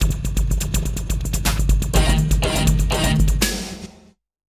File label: taunt; dota; nightstalker